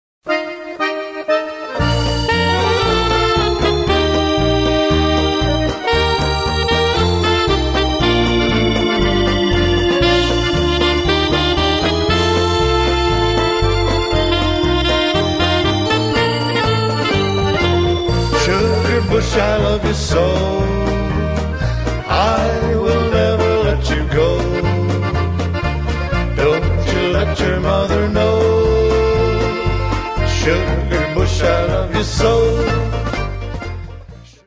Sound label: Sugar Bush Polka